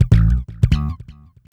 BASS, Hip Hop R_n B; USB Soundscan vol.19

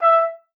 E5, TRUMPET; Best Service ProSamples vol.42 - Session Instruments; PS-42 Session Instruments

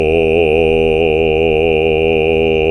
BASS, Roland

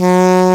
SAX G#2, JAZZ

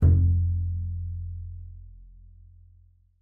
PIZM F1 -R, BASS PIZZ; Xsample VOL-5

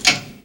HYDRAULIC; Northstar; The Wizard VOL-1

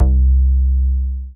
Single Electric Basses, Dance Floor Bass

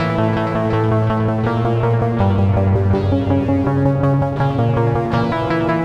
Seq Dark, Drum & Bass; Sonic Foundry (Sony Creative Software); Knutrix Drum & Bass

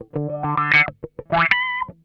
OUCH, Funk Guitar; Zero G - Funk Guitar

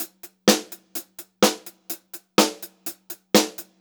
126 HH, Pop-Rock Drumloops

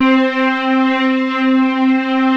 PAD, Vintage Voltage

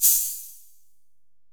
808 CHH, 480L; Wizoo - Platinum 24 Electronic; 480L SP 808

